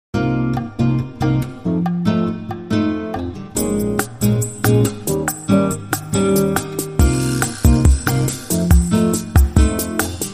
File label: Bossa Nova